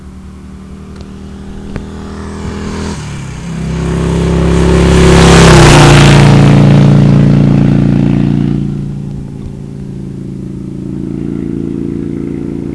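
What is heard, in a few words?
Vincent Rapide High Speed, motorcycle; 1950; 1950 Vincent Rapide at Speed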